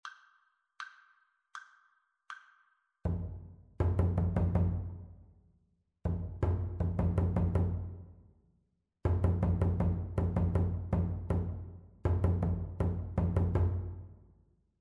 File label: rhythmic dictation; ear training